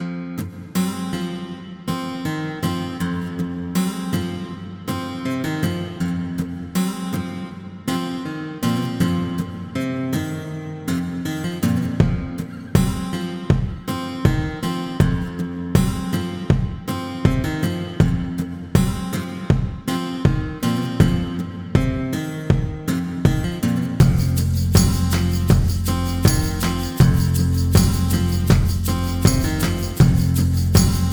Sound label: Country folk trailer; Countryfolktrailer Main